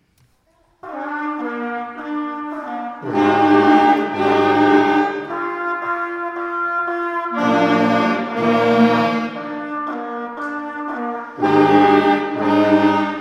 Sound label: fanfare march